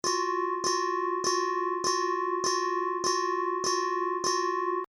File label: railroad fm bell e piano